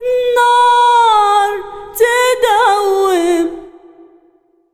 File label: SINGER, ArabianTraditions